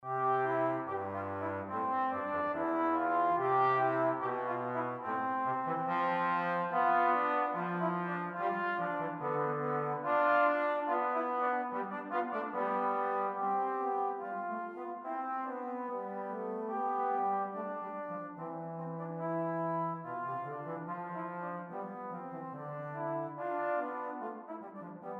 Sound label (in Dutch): Duetten voor trombone; Duet 1